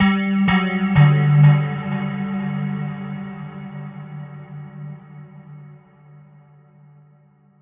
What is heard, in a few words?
bell echoes, synth and electronic loops